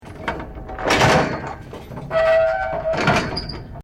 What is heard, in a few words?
METAL PIVOT LEVER IMPACT SQUEAK; Mechanical actions; Materials